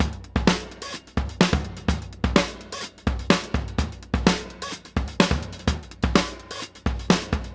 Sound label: Funky16 127, Beat Loops; Zero-G; Monster Beats